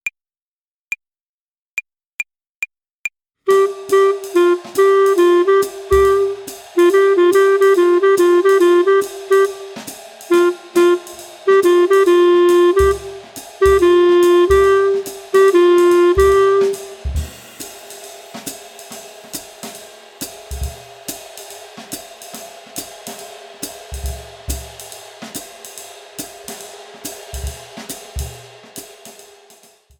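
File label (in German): Swing Phrasierung Übung; play along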